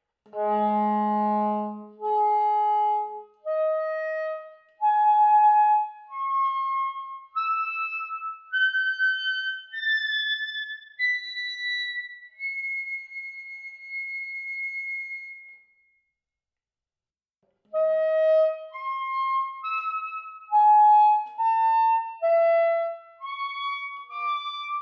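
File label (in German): sop, Flageoletts, Obertonreihe vom tiefsten Ton b (a bei Bariton) nach oben gespielt; Obertonspiel mit wechselnden Grundtönen. Sopran; saxophon; spieltechniken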